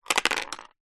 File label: leisure dice tip from plastic shaker onto table